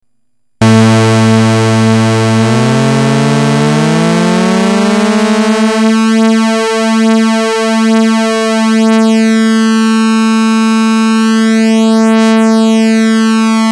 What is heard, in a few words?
schweben saw